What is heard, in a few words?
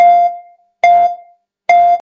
countdown beeps